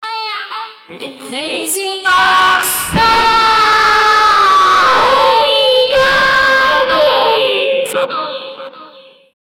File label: tvs scooty, scooter